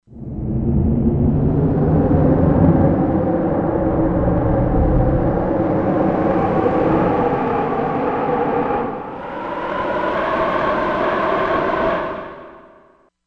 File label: Wind; Korg MSC-16S Environment